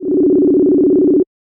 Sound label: chiming